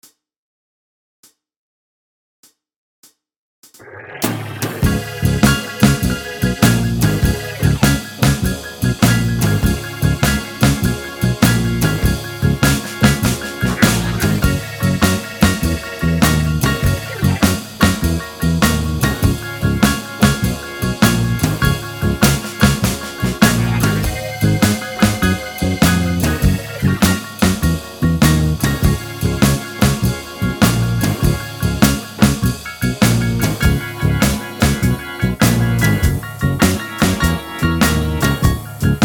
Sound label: backing track alto, saxophone